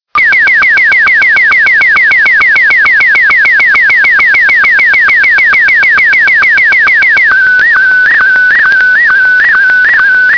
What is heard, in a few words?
Sstv Martin 1; F4FJP; Radioamateur